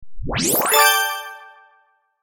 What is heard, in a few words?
accept